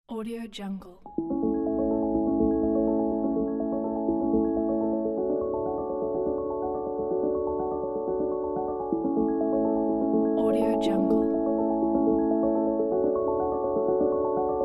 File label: afternoon fog